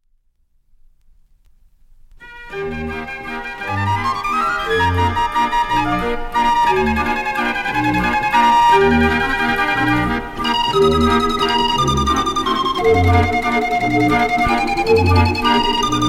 Belgische Serenade, draaiorgel; de cementmolen

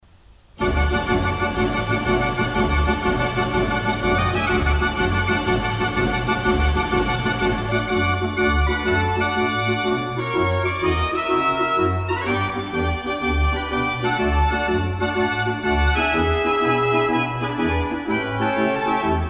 draaiorgel; draaiorgel t piepenrek

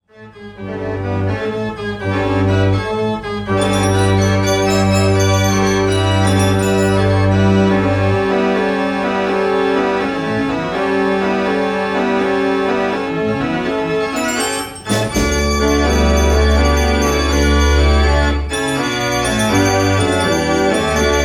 concertorgel; A. Ruth no36; boheme

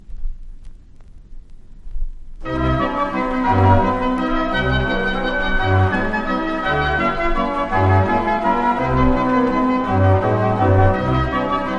wals, draaiorgel; de turk

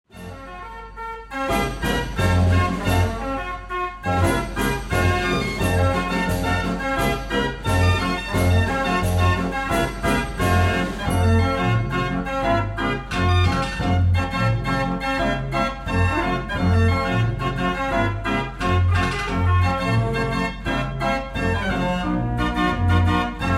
pansfluiter

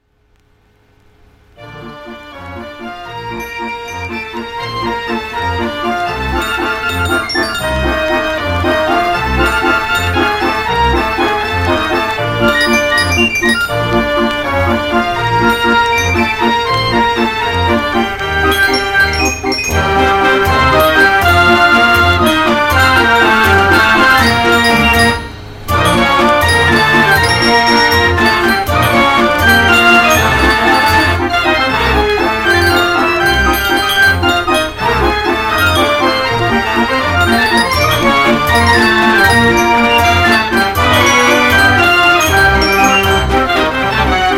89 toets gavioli; kermisorgel

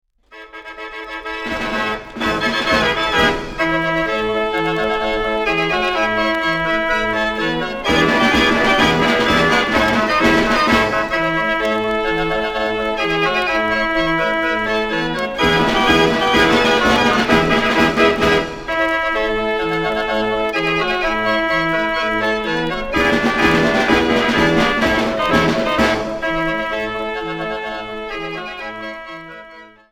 lunapark, draaiorgel; lunapark buwalda; wellershaus orgel buwalda jubileum concert wartena

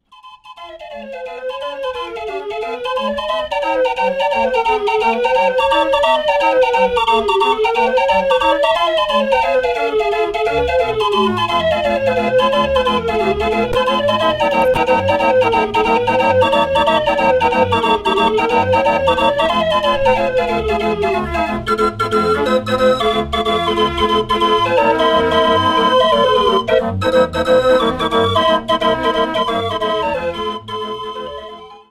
draaiorgel keistad

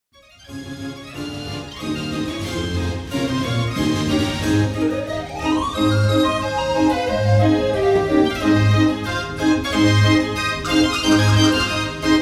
talsma polka, draaiorgel; de fruitschalen

